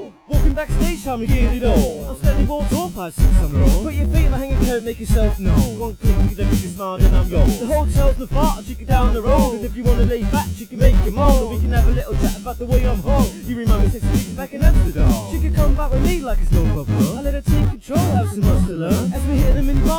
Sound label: easy harmonic